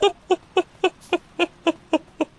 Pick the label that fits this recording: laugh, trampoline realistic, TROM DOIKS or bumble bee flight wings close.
laugh